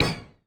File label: Korg 01W MegaDrums; 300 Drum Machines